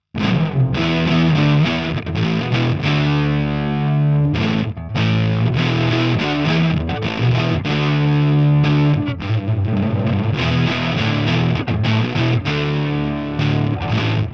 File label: LP studio Hiwatt, guitars